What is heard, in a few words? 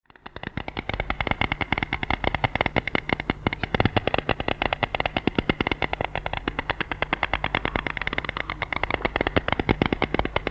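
sounds of stork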